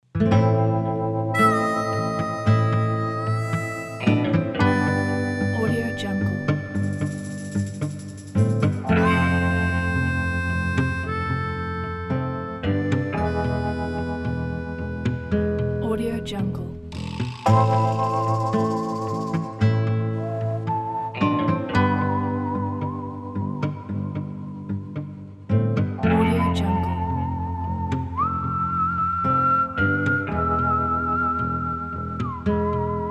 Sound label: spaghetti western